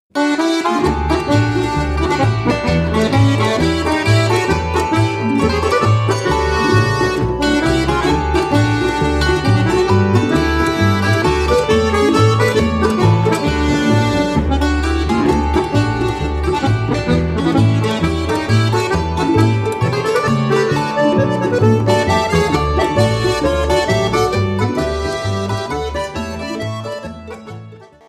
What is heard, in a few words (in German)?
polka